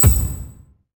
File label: passive warning; LoL; evelynn